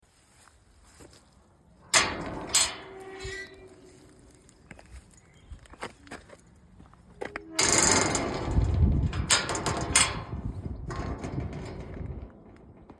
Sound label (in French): ANCIEN sortie, PORTAILS